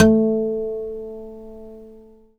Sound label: GUI Ac Har A, GUIT AC; Best Service Gigapack 2